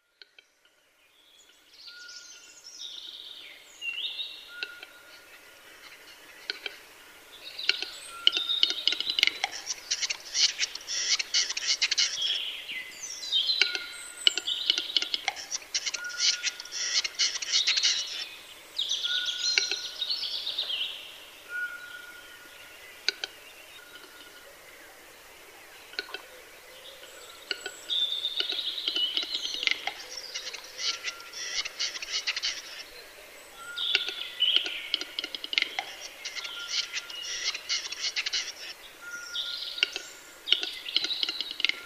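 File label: sounds of capercaillie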